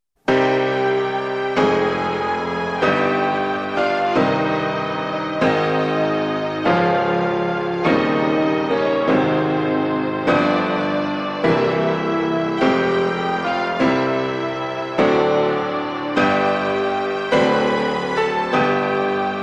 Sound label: Prelude In C Minor instrumental